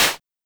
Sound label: Simmons Clap